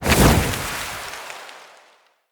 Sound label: Fey Ward Flock Cast Release